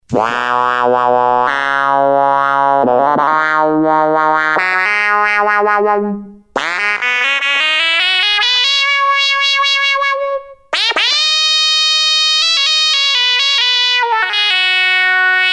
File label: Funny Toy